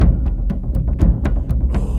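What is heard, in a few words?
Music Shield; from dust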